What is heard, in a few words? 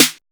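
street snare; Drums In Your FACE!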